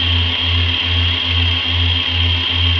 asteroid beam loop

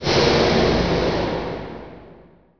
scrape metal

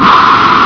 WOOSH

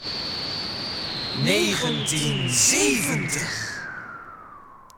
jinglepackage; topformat LP jinglepackage 1

